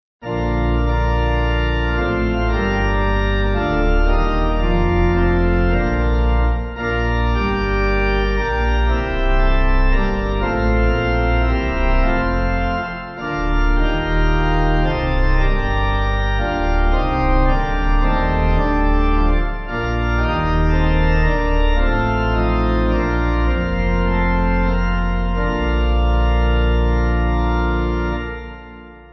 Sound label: Pipe; O God Thy Soldiers; Grenoble